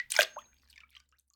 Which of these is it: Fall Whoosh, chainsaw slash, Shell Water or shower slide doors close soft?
Shell Water